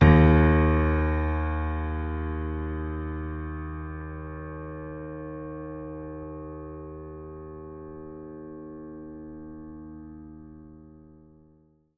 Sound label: D sharp 3